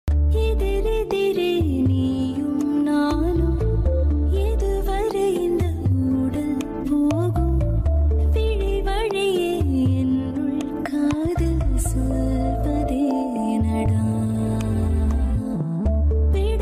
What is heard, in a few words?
Serial Ringtone, Zee Tamil TV